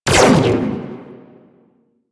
Burst Laser Pistol Prime Fire; DE; Warframe; Weapons; Sentinel

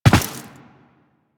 Land Default, Footsteps; Warframe; Tenno

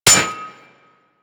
Melee Axe Impact Generic; Warframe; Weapons; Axes

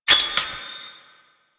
Grenade Pickup, UI; Kahl; Warframe; Veilbreaker

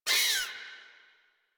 Forest Rodent Vocal Pain; Characters; Warframe

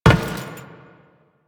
Step Metal, footsteps; Warframe; Teshin

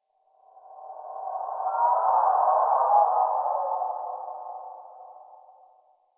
Eerie Howl Cry; Ambience; Misc Outdoor; Warframe; Infested Eris

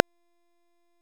Hunter Bow Draw; Weapons; Enemy; Bows

